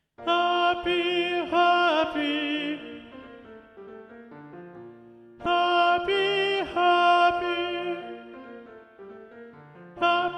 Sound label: Happy We Alto Predominant; Acis and Galatea; Handel